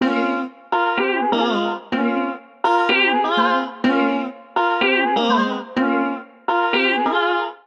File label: Sliced Melodies F Minor 125 bpm; MIDI Clips; Ableton; Factory Packs; Voice Box; Harmonic Racks